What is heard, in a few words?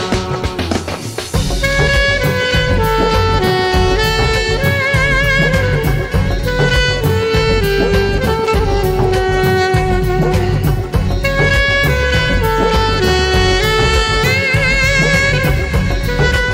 instrumental music ringtone